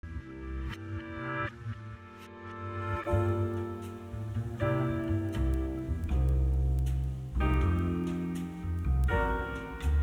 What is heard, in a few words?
swag beat